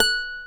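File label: F#5 FLAMENCO, FLAMENCO guitar; WorldSounds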